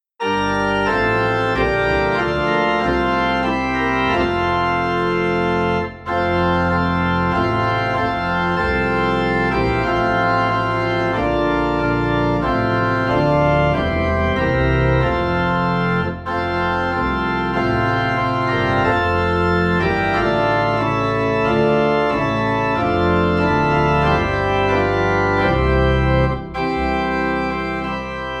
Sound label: Angels From the Realms of Glory - Organ Low in F; christmas